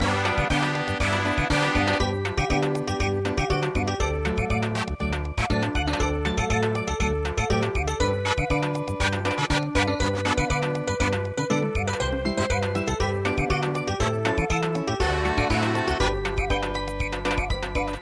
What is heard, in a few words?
samba; If Youre Happy and You Know It; handclap